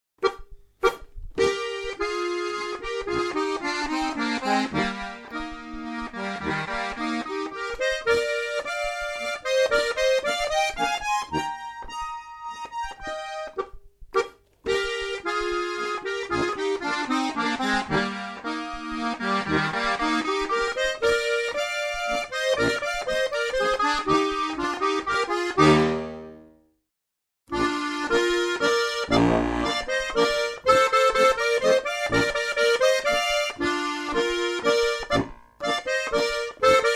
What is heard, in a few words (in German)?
Landler